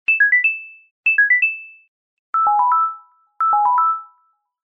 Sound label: Oven Buzzer and SPK